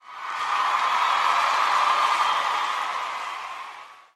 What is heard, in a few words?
cheer; twitch